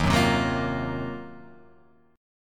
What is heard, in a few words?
D sharp Minor 6th Add 9th D sharp Guitar Open F, chord; strummed; JGuitar